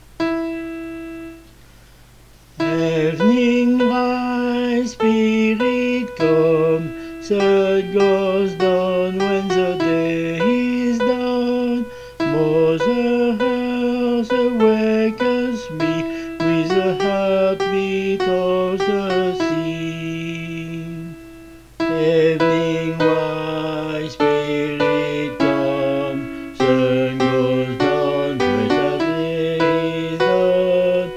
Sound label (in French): Evening rise piano et chant; 6 voix